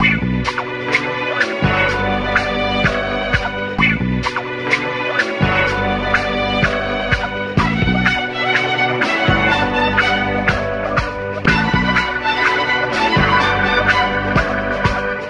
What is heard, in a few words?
strings and guitar wocka wocka chicka wocka, sad; Loops